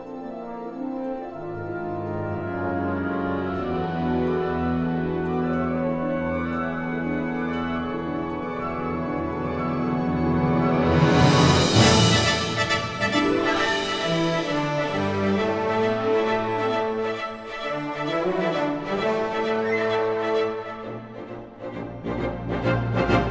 Fantasia alla marcia for piano, chorus and orchestra; KHII